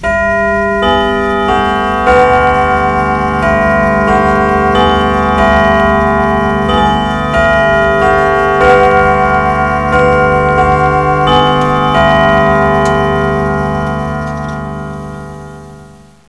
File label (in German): klang uhren